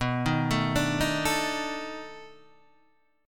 B 7th Sharp 9th, guitar; B7#9 Chord {7 6 7 7 4 5} arpeggiated

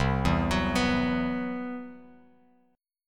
C Major Sharp 11th, Bass Standard; CM#11 arpeggiated; JGuitar

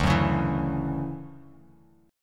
D-Major 9th D down Bass Standard; DM9; strummed; JGuitar

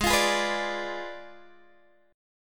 G sharp Diminished 7th G sharp, Guitar Open D

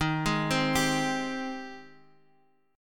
Ab Major 7th Eb

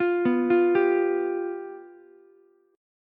F Suspended 2nd Flat 5th Ukulele, chord; arpeggiated; 10,11,13,10; Fsus2b5

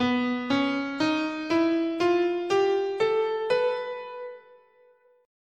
B Major Locrian, scale

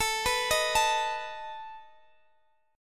A Suspended 2nd Flat 5th, Guitar, Drop D

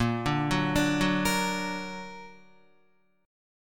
Bb Augmented Bb down Guitar; arpeggiated

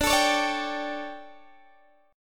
D Major 7th Suspended 4th, Guitar, Open D

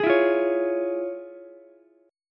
F Minor Major 7th Sharp 5th Ukulele; FmM7#5 strummed; JGuitar

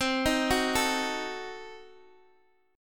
C Diminished 7th, Guitar DADGAD, chord

